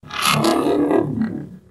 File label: 140 FX LOOPS